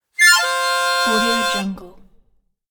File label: harmonica fall accent